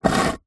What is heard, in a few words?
brick small move scrape on dirty concrete; foley; zapsplat